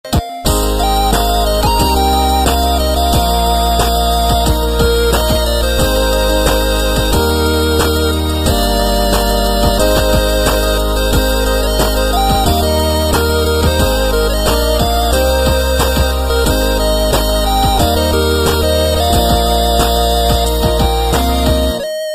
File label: 8bit